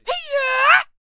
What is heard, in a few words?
Shouter Attack Vocals